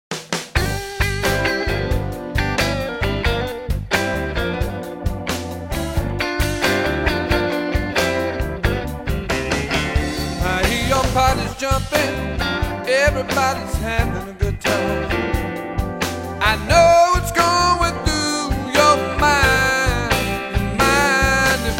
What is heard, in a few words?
Down Home Blues